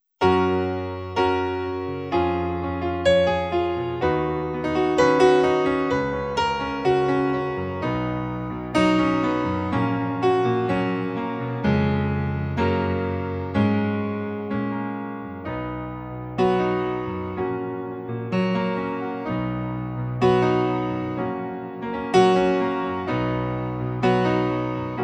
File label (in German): Fis Dur 63 Bpm